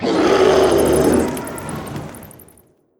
Orc Scream Terror; Legend of Keepers

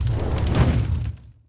Attack Magician; Legend of Keepers

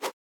Window Close

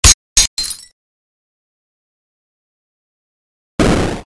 Fail screen

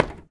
Footstep Plastic Tech

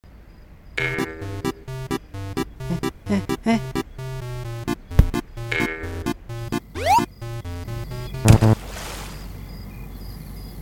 Jumble

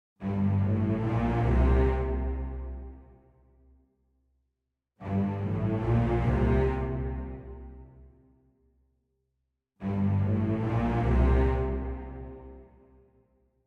Dialogue Celli Bass; BattleSabaku; Manago 2

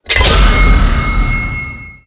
Monster Generic Evolution; Rampage

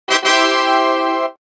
Tada

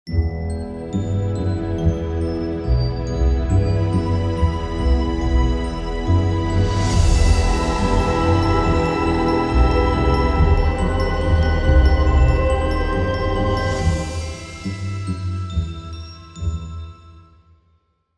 Music BGM Cutscene; Wenjia; Cutscene 02

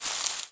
Grass footstep

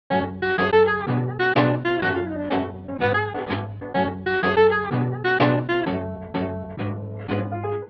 swing sample 16 Beats

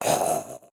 Death

